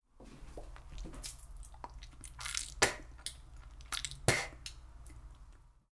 吐き出し音 嫌そうな感じでペッと精液を吐き出す

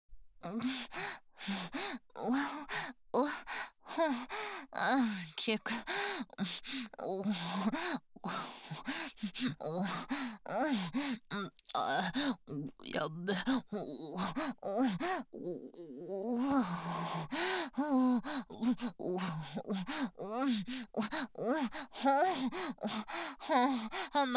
嘘喘ぎ

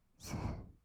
吐く音, ブレス, kawaii voice; ASMR; 1200 kawaii voice!!

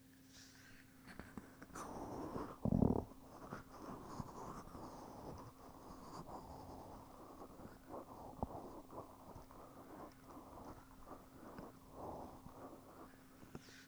絹連れ

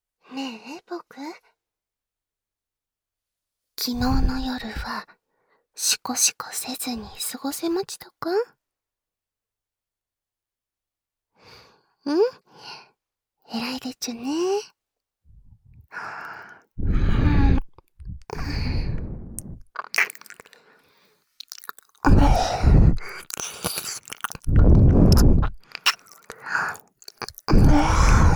耳舐めと授乳甘出し手コキ（クチュ無し）

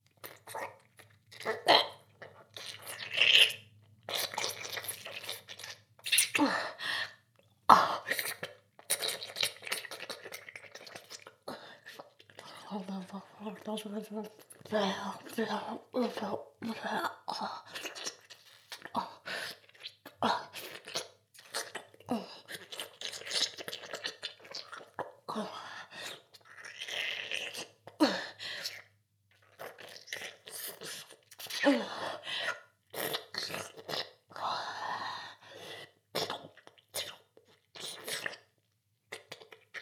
フェラ, ASMR; ダウナー風俗

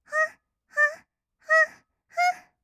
ほっほっ, kawaii voice, 合いの手

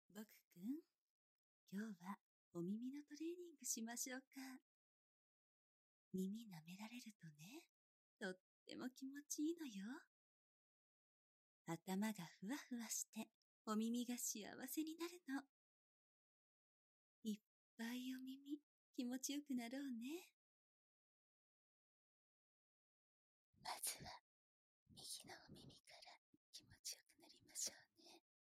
耳舐め; 耳舐め性指

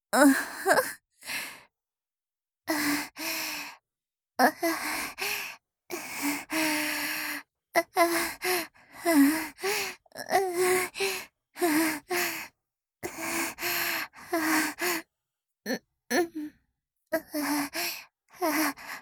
相手の腰にまたがり緩やかに腰を回す・振る

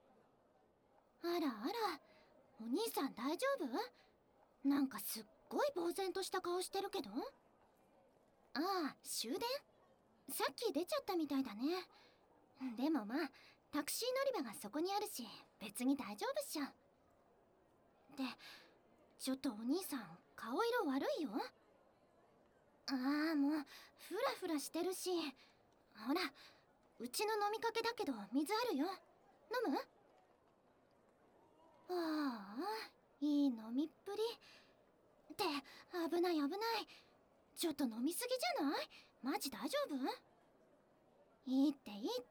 キス; ねぇねぇお兄さん、大好きって…言ってもいい？

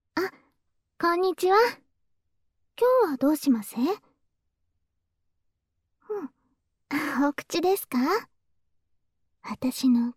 フェラ; フェラ抜き500円コース

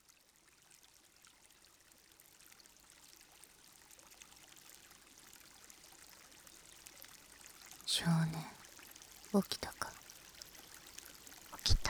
川辺のダブル耳なめ; ASMR

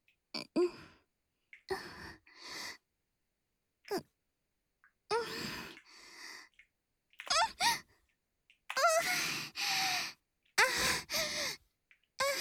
喘ぎ（SEあり）喘息

